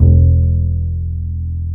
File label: DBL BASS E1; AKAI S-Series CD-ROM Sound Library VOL-2